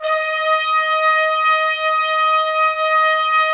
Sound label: Trumpets; Roland - LA Composer vol. 4